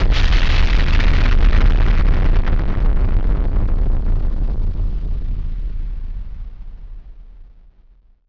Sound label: Drum Bomb; musicradar; bomb factory; Drum Bombs